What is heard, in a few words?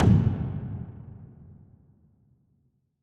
Frequency Impact